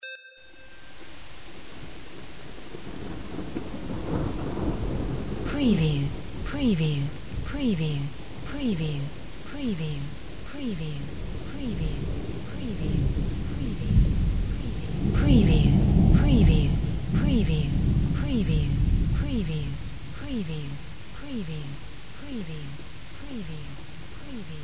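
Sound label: weather rain thunder; windy beach audio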